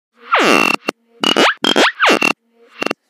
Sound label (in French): Couine Grogne